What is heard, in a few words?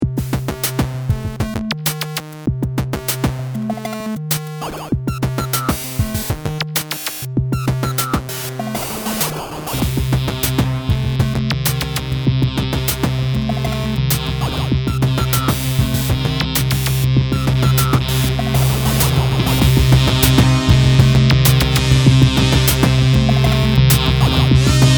Unstable Wavetable